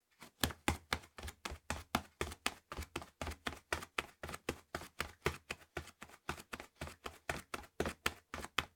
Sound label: step; はだしで走る